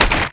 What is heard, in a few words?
axe mining; factorio; boompuff